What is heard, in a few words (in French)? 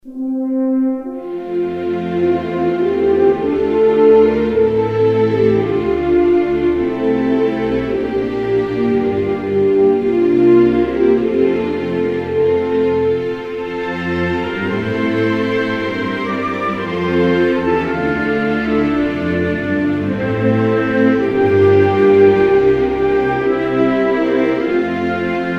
Burkina Faso, Hymnes nationaux